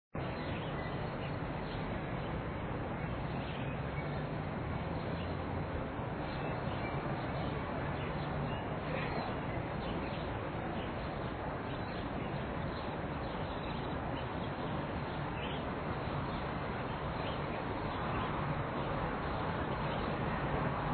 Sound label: square birds